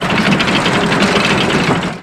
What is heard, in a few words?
door; Shin Megami Tensei IV Apocalypse; 3DS